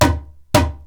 IJEILU HITS; Best Service; World Colours

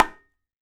KHOL, DRUMS OF IND; AKAI S6000 CD-ROM; WorldSounds

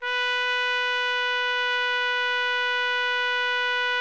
TRUMPET, SECTION LOOP; Best Service; Killer Horns

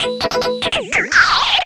GIT LP, Drum & Bass, 145 BPM; Ueberschall; Drum & Bass Flash Trackz

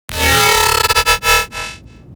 D Bass One Shot

